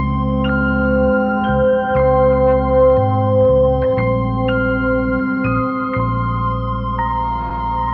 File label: keys